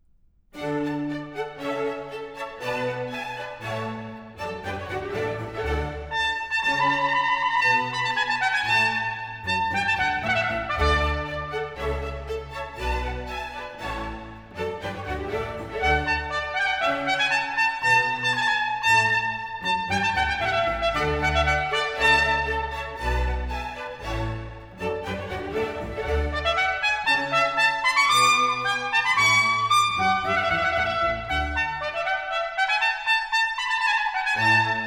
Sonata, for trumpet, strings & continuo, Allegro e staccato